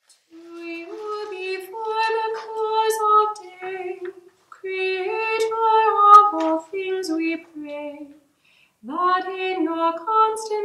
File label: choir